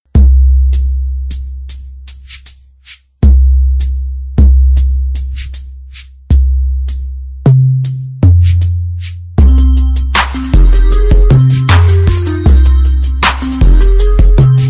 toque

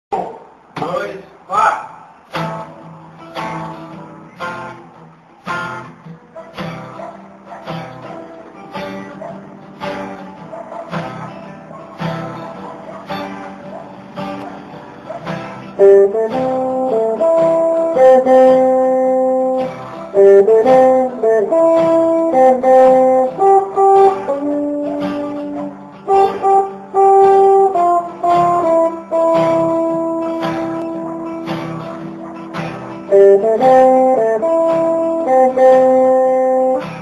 versao reggae

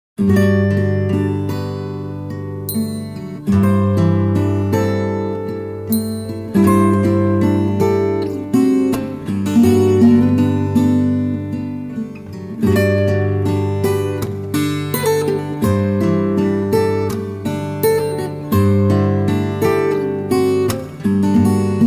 viola e acordeon

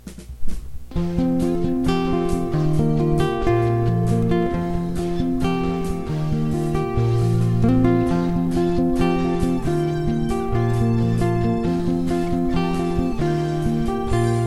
banda violao

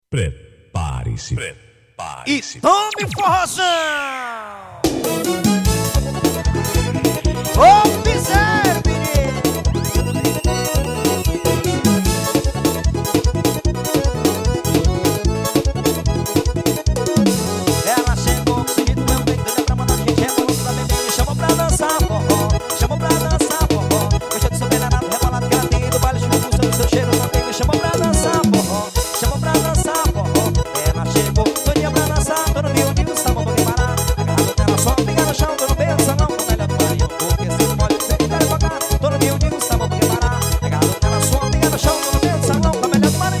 forro

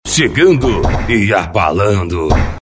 vinheta; vinhetas para dj e radio; chegando abalando

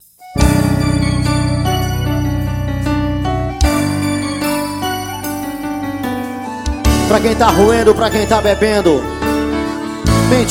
forro estourado capela

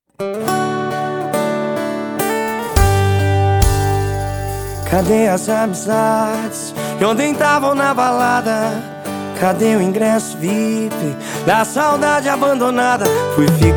sertanejo universitario sofrencia

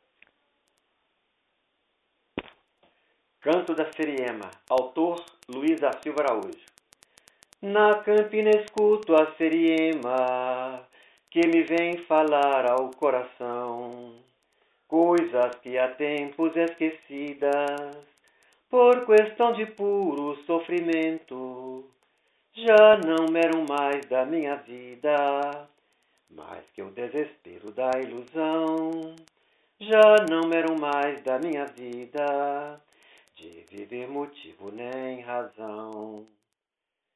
canto da seriema

